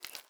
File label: ui pickup meat; Rust